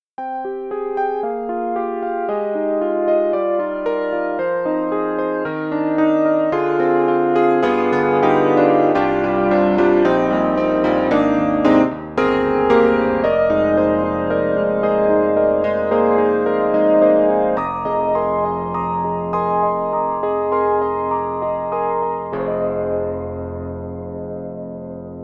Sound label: Yamaha CP80, Flanger, Resonant Flanger; Steinberg; The Grand 3